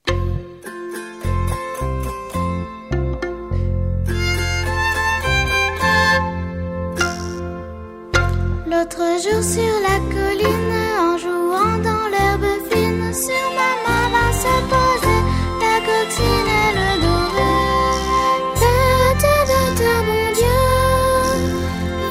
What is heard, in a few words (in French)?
La coccinelle 1ère voix seule, Ecoles Qui Chantent; EcolesQuiChantent 1999-2000